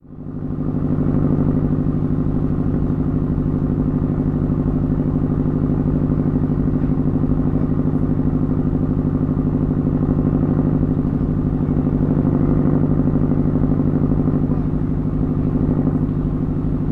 Motor Boat

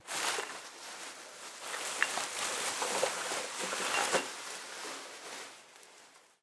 Garbage Bag

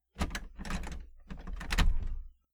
Door Knob Wiggling

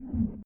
Swing sound

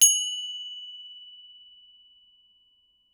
Bell little medium strike

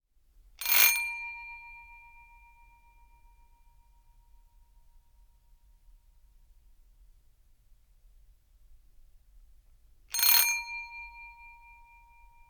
Doorbell Antique Twist Bell

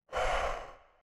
Sighs

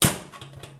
Closing Metal Locker

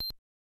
Digital Beep

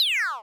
Zap

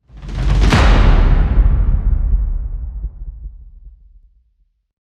Whoosh Bang Dragon Roll

